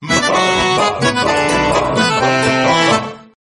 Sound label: nokia russian; ringtone